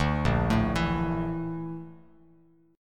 Bb Major Sharp 11th, Bass Standard; arpeggiated; JGuitar

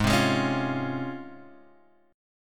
C sharp Major 7th

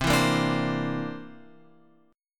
C 9th, chord; C9 Chord {8 7 8 7 X 8} strummed

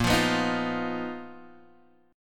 B Major 7th Flat 5th Bb, chord shape

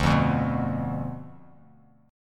D Minor 6th Bass Standard; Dm6 strummed; JGuitar

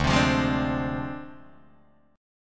D Minor 7th Flat 5th Guitar Open D

